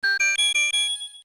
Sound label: Key; A key appears